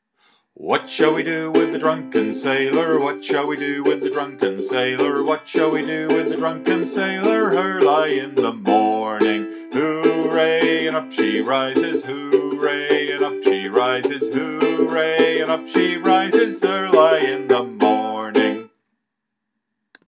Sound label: drunken sailor sing and play